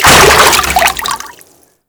Frame Step Water; Warframe; Vay Hek

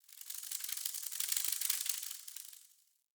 Cave Sprout Insect Close

